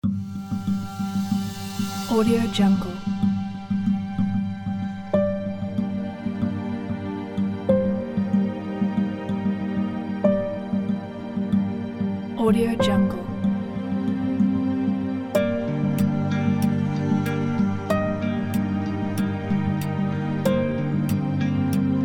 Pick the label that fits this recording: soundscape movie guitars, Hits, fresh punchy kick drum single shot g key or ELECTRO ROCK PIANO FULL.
soundscape movie guitars